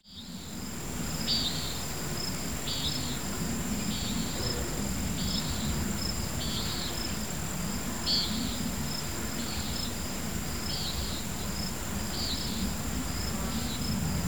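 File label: Common Nighthawk; Carrizo Canyon CO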